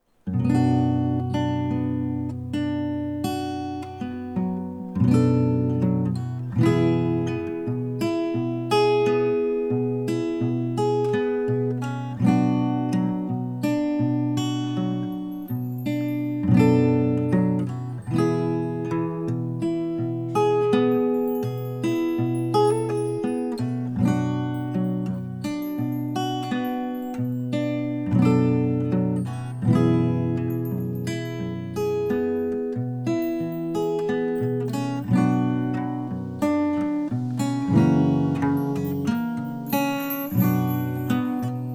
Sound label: Song (Instrumental Reprise)